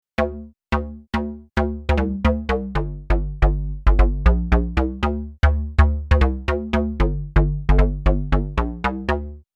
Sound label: Bass